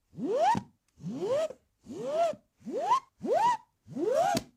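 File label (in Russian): смешные звуки из мультфильма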